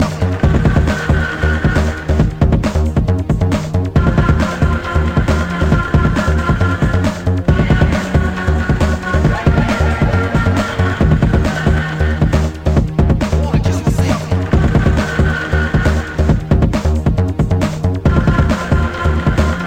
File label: techno; techno viking